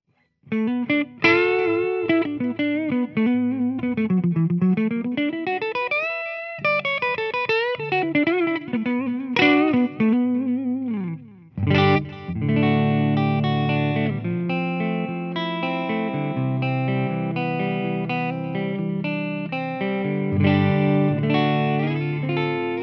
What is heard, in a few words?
neck clean, goldtop; wcr crossroads